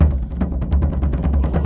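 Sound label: Music Shield; From Dust; PS3; Xbox 360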